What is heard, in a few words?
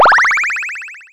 SPACE DRUM